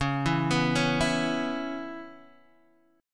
Db Minor 7th Db Guitar Open D

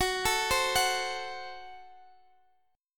Gb Diminished Gb, Guitar Standard; arpeggiated; x,x,16,14,13,14; JGuitar

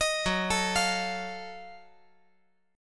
F sharp Minor Major 13th; arpeggiated; JGuitar

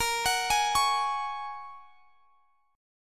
Bb Minor 7th Sharp 5th; Bbm7#5 arpeggiated

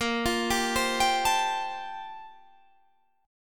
G Minor 11th A sharp

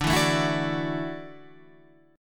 Gb Minor 7th Sharp 5th, Guitar

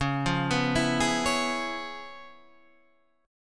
Db 7th Sharp 9th Db, down, Guitar Open G

